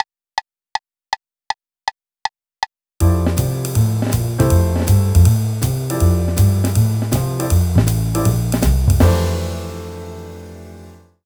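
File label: major chord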